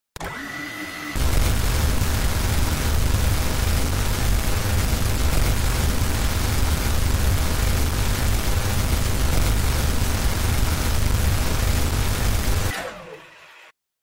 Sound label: minigun, weapons